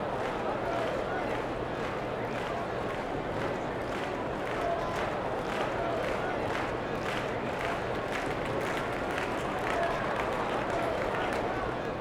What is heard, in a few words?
Baseball Stadium Cheer